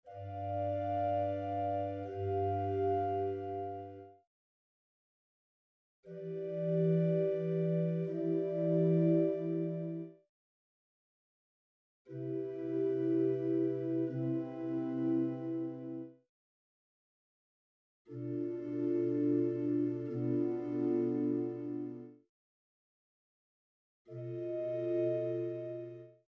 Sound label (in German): Stammaccord der Septime